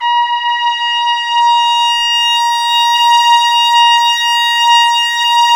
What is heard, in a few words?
BRS TP SWE, Orch Ensembl; Roland L-CDX-03 Disk 2